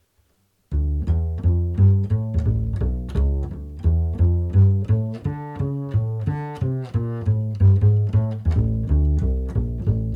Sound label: string Bass f2, mic tests